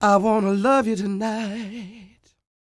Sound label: CROON, Vocal Blues & Soul MELLOW